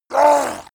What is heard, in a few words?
yeti shout short pain